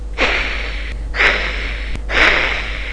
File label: Lizard; Mr. Wonder's Greatest Toyshop on Earth